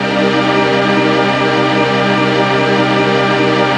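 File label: synthesizer, C4; Sleep; Best Service Gigapack 1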